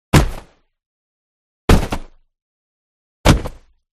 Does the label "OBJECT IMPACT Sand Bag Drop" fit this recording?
Yes